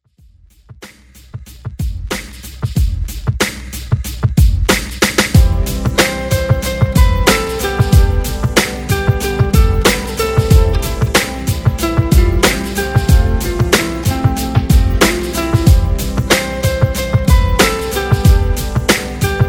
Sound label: 90s Pop Redrum